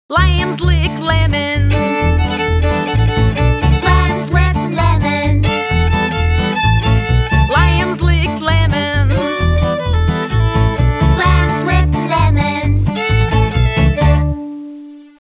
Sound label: Song Letters l L S O N G; School; Fisher Price Ready for School Kindergarten